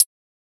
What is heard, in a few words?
closed hihat, drum one shots